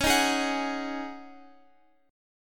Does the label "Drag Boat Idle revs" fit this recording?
No